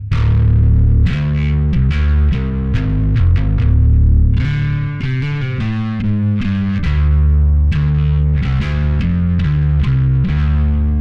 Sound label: Distortion Deep dry